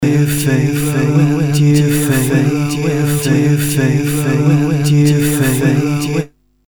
Synthesized Loop Very Coarse

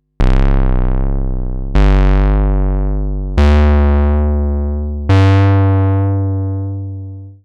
SINGLE OSC MOD COTK Model 15

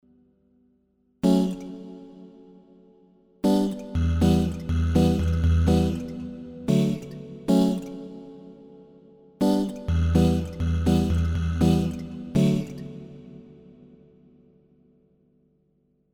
Loop And Release

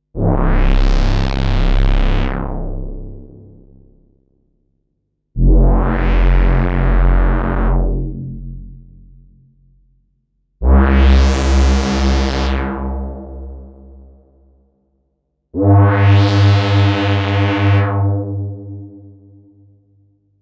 Moog Model 15 iOS

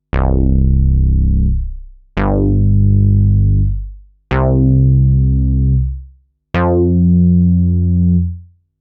Moog Model 15 iOS

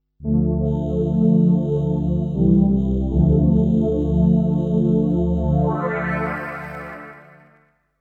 ASR 10 Transwaves classic pad

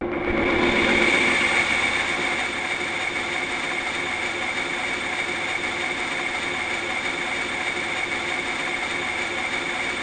ASR10 Turning Atmo

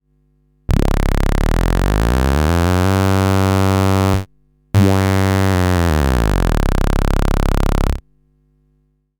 low funk to the high Model 15

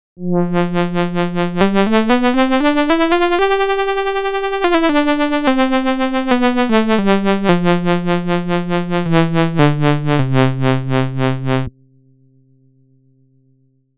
WHEEL POLY Arturia Modular V3

